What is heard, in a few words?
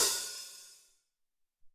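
Open Hat, Alesis SR-18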